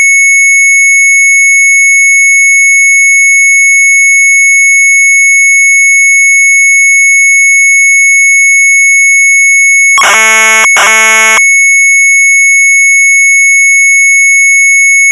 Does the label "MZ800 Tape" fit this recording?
Yes